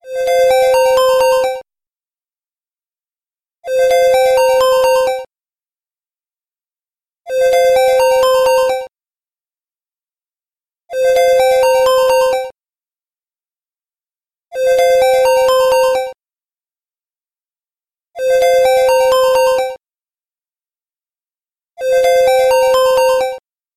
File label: business cell, sms